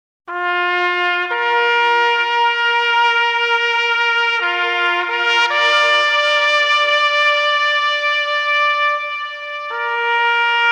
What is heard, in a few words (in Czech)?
zlaté saxofony